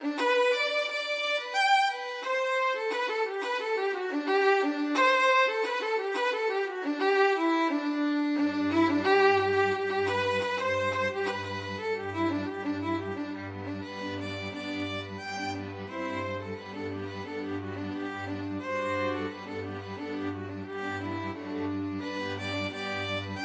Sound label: cotton eyed joe, string quartet